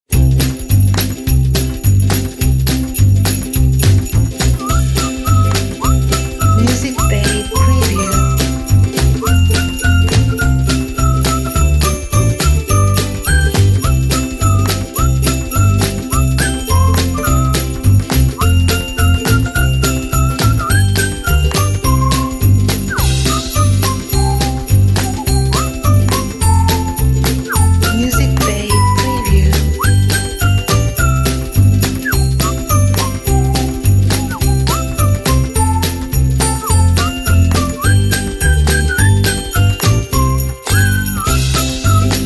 Jingle Bells Christmas

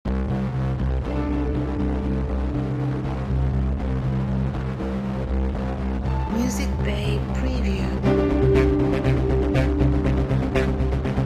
Dystopian Opening Theme

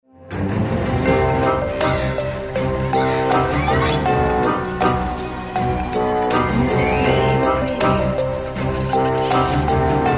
Spooky

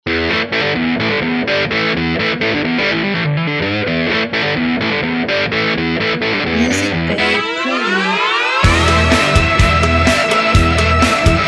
Intro Rock

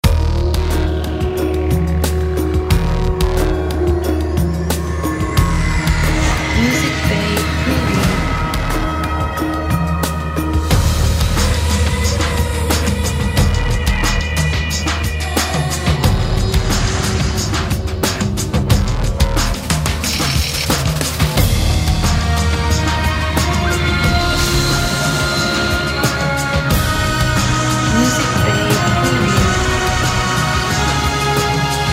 Asian Soul

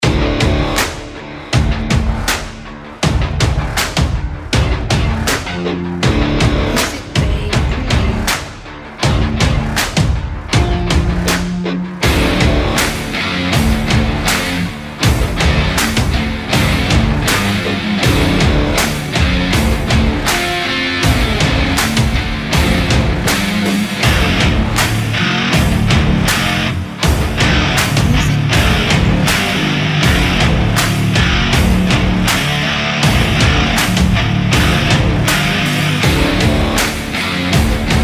Epic Rock Drums and Guitars

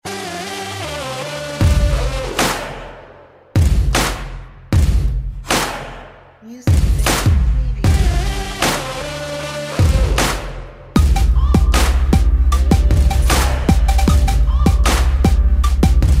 Hip Hop and Trap Action Cinematic Trailer

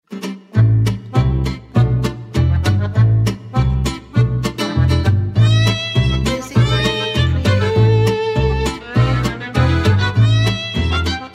French Chanson

